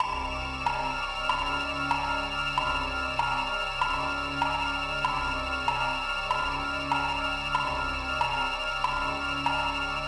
TENSION; MEANCITY

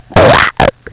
open door; Escape Beyond 2001 v1.0

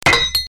stone; Genesis; Music

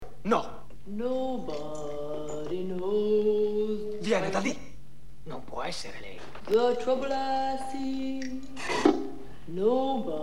Vespa; Balle Spaziali

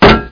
metal

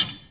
menu adjust down; Daikatana

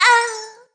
djinn hit; DAoC; Toa

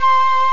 FLUTE

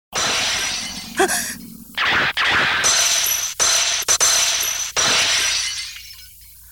Glass; kyuuketsuki miyu

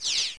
beam

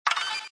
Menu Popup; Buzz Light-years Away II

